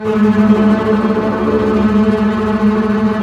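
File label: double bass tremolo; String Master Series; Cbs FX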